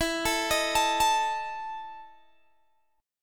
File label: E Major 7th Suspended 4th E down Guitar Open G